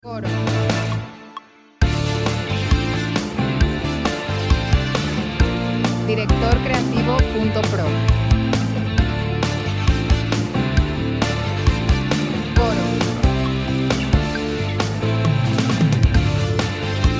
Admirable, 134BPM, 4/4, F

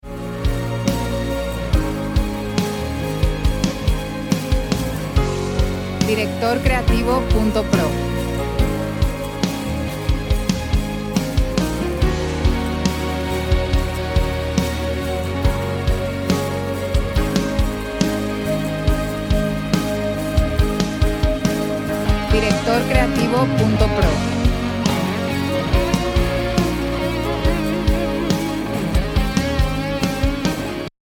Precioso Jesús, 70BPM, 4/4, C

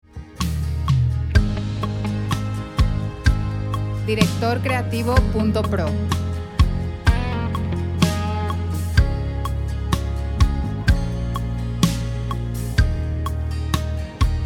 63BPM, 4/4, Ab

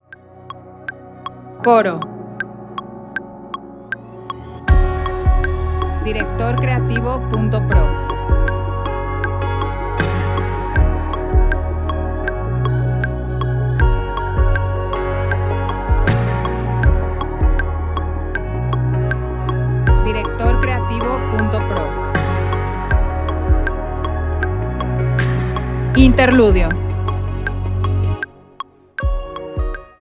Jesús, Gb, 79, 4/4

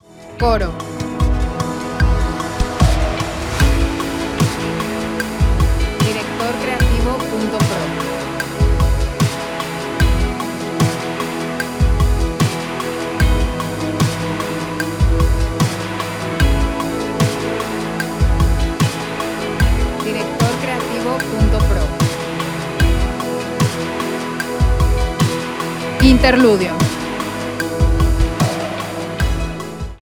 E, 75, 4/4

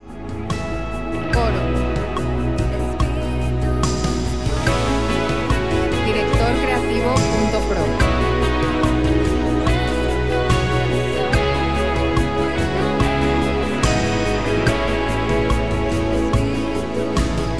72bpm, 4/4, E

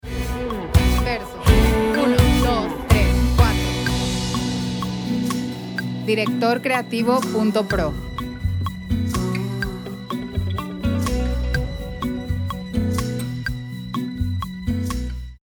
voz

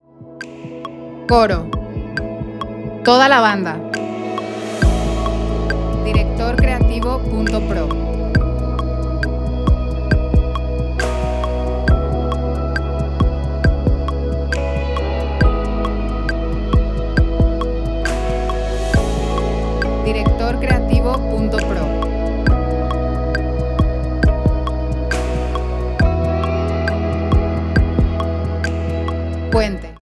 E, 68, 4/4

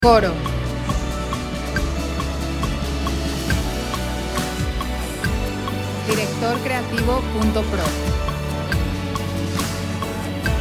Musica En El Desierto, 69bpm, 4/4, D

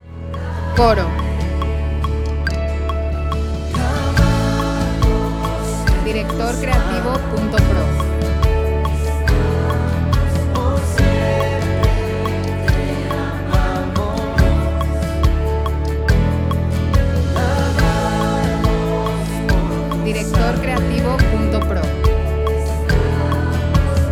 A, 70.50, 4/4

